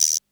Roland CR-78 Guiro Short; classic machines; Reverb Roland CR-78 Sample Pack

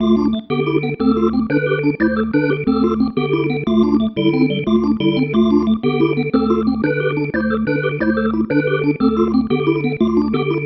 Pattern, Organ

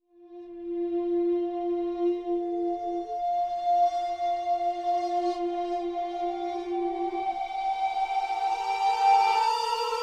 Reverse Flute; Sonic Foundry (Sony Creative Software); Ma Ja Le Ethereal Textures; Flutes

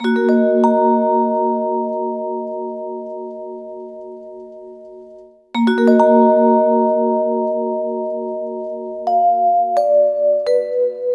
Vibes

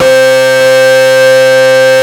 WAILING, DIRE LIKE; East West - Global Sounds

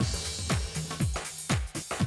Classic Drum Loops; Best Service; XXL 1500 Classic DrumLoops; Sample CDs

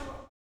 STOMPS

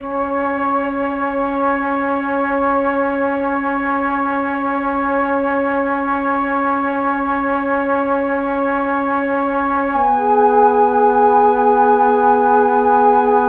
Flutes, Cinematic Funk Urban; Sonic Foundry (Sony Creative Software); Cinematic Funk Urban Tone Poems

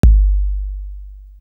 Kick, Drum One Shots; AMG - Coldcut's Kleptomania VOL-1

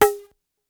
Conga Shake; Lost Tapes From Mars

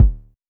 Polite kicks D1, Short; LIMAT Div Dept; Drums

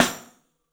MARFSN, 3D Drums, X STICK; 3D Sound

